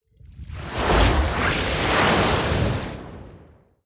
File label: water magic